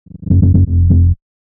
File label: 125 BPM A# Min Bass Fill; Melody Loops; Loop Stems; Cymatics; Antidote